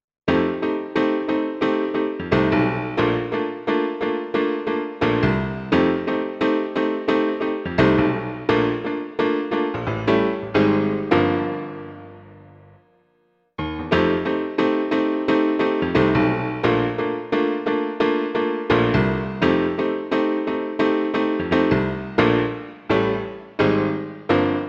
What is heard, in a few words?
PC3-A8 Hip-Hop Piano